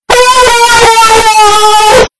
trombone

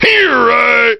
strike; griffey

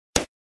bloon pop; btd

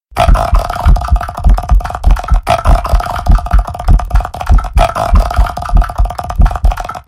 heartbeat

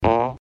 fart

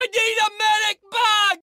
medic bag

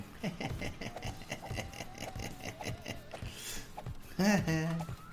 drunk laugh

